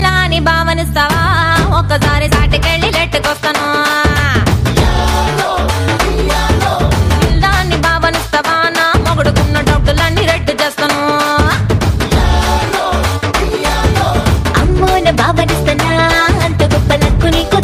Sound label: beat